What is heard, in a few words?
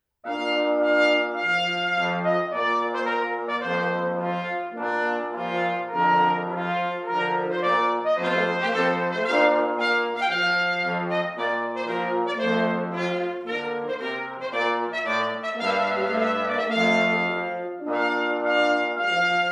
Trumpet Tune; Purcell